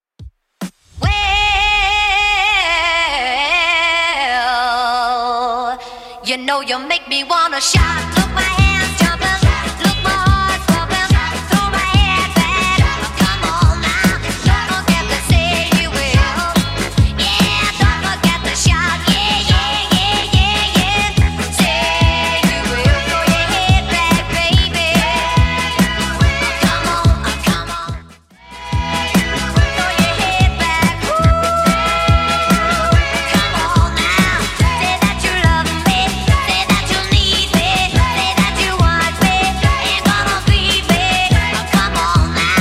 60s Redrum 143bpm

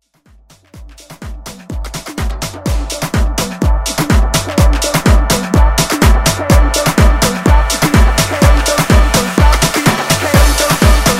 Afro Style

